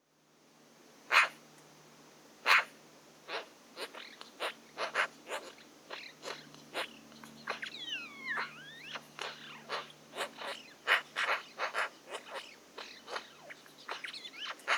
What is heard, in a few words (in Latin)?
Pica pica; SETE